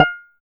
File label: XTRA PERC